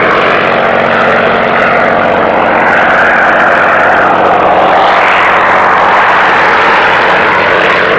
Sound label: engine bomber rpm far; War Thunder; engines